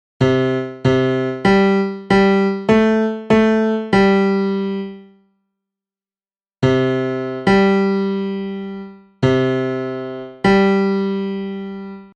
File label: twinkle twinkle perfect fifth